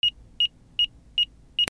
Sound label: ALARMS